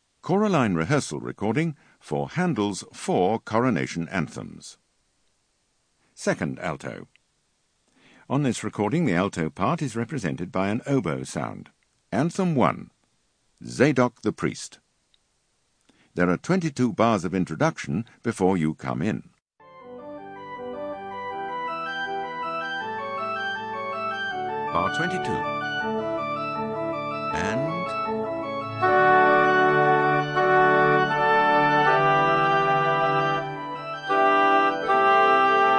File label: Alto; Handel - Coro Anthems